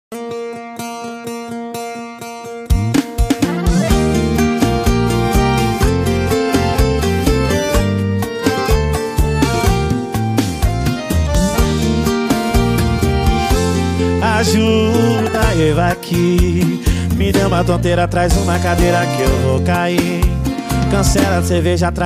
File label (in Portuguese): mega funk; mega nejo